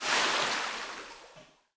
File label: Splash, Water; Barotrauma; Subsurface